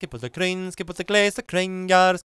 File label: GAELIC, World Voices; ILIO; Vocal Planet